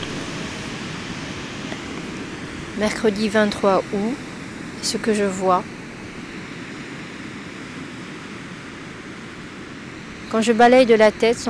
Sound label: ocean